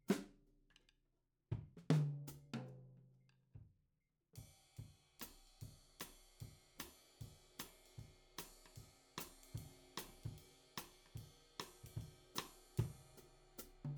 Ragtime

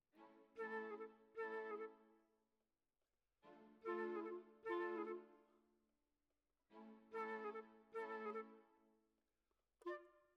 Flute

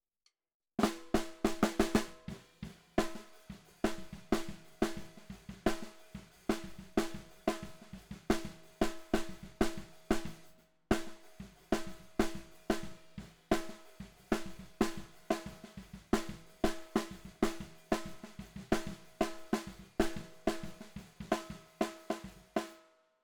SNR Bot

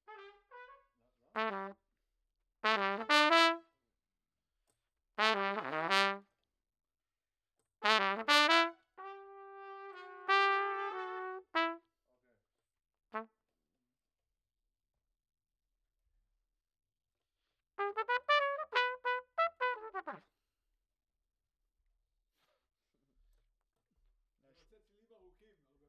Trumpet

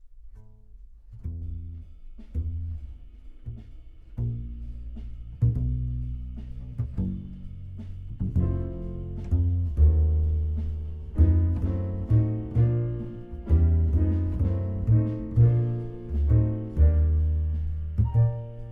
Bass Bot

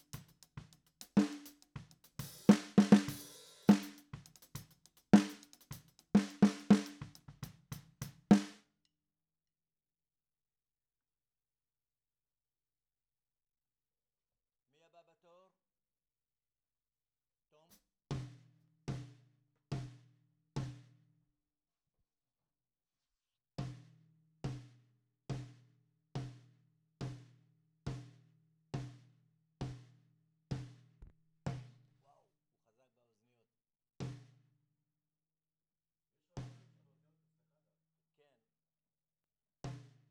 SNR TOP

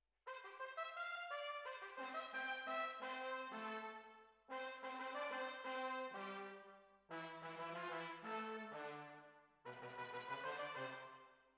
Horns

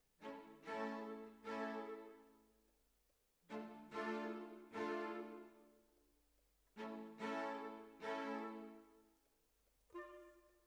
Decca, Jazz for piano

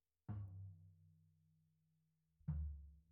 Sub

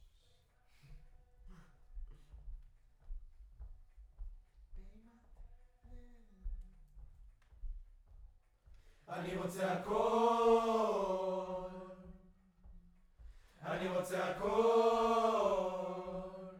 Choir Far

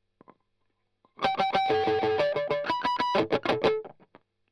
Strum Line

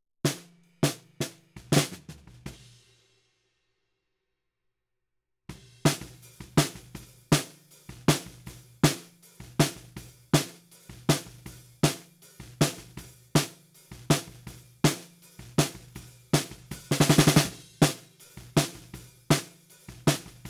Snare Bottom, 160 BPM

